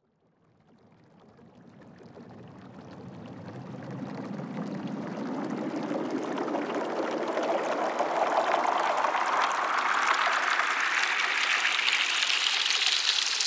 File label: Riser Water; One-Shots; SFX; Ghosthack; Color Bass Essentials